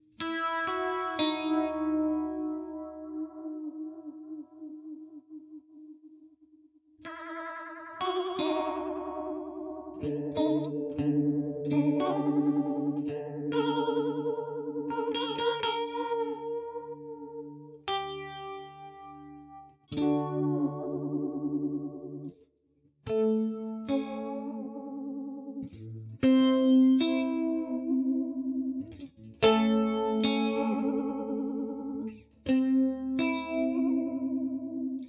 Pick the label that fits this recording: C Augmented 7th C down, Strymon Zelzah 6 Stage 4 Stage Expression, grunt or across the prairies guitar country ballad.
Strymon Zelzah 6 Stage 4 Stage Expression